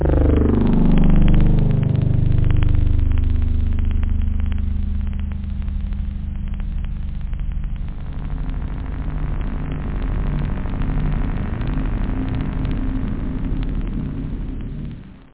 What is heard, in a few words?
atmosfer, synth chords; da capo vol1